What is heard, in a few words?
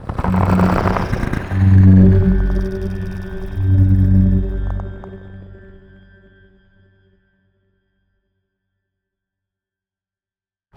idle, Jove